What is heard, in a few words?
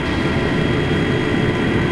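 factory; Splashdown; XBOX; Soultree; Threeriv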